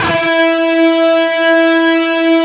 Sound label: LEAD E 3; East West; Steve Stevens Guitar Samples Collection